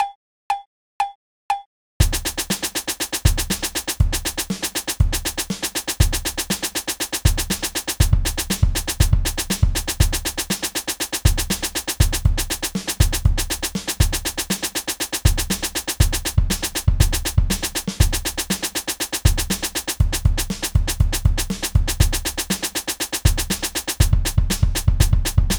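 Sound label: tap timing practice w snare bpm120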